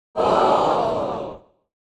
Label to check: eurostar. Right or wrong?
Wrong